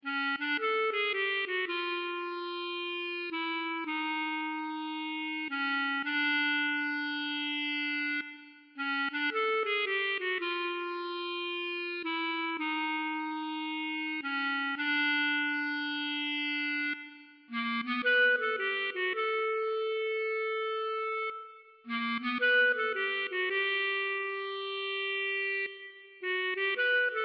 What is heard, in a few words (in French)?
Clarinette